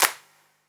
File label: Claps & Snares